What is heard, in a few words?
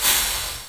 8-Bit Ride, Drum Hits; Zero-G; Soundclash